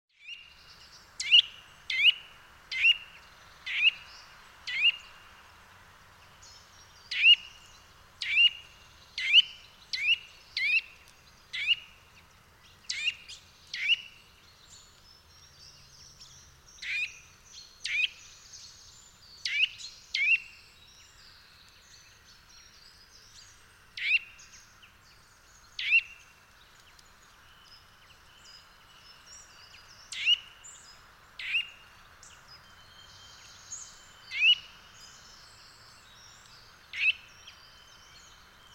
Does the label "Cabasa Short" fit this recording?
No